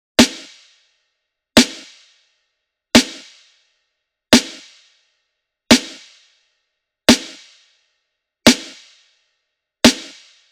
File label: Drum Loop - 87 BPM Snare, Boom Bap; Earth; Cymatics - ANALOG EVOLUTION Beta